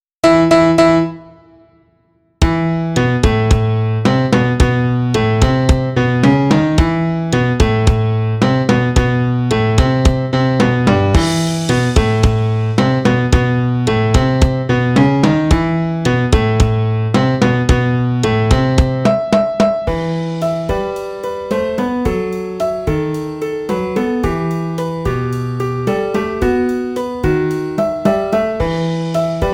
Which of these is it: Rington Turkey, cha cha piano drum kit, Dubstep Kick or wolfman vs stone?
cha cha piano drum kit